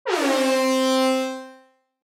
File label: Reggae air horn C3